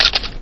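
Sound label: single sound of a reflex camera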